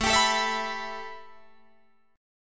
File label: A 7th Suspended 2nd A Guitar Open D, chord